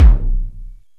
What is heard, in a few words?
ELECT PERC; OMI - Universe of Sounds